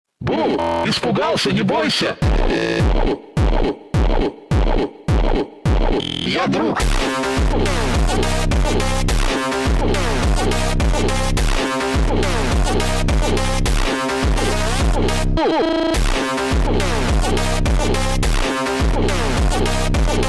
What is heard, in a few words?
brazilian phonk